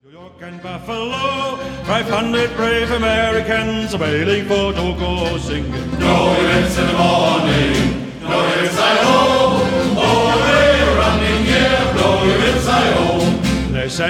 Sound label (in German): Shanty Chor